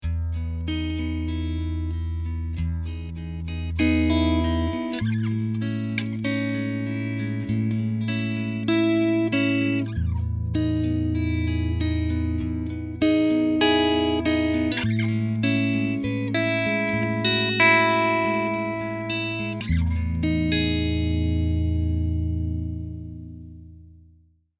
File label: Jazzmaster pickups